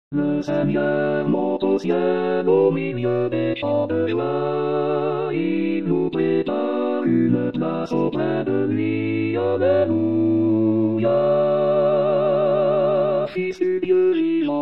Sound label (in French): chant pour ascension, Chorale; Pentecote